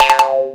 tail, Wild Animals; PC Play & Learn - Wild Animals

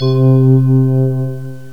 fantasia, synth pad; gmplay

